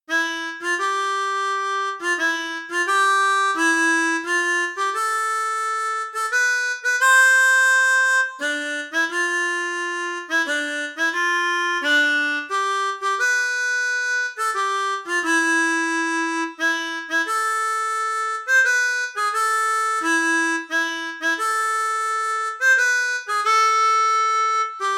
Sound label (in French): Hymne Grec, harmonica